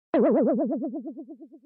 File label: scared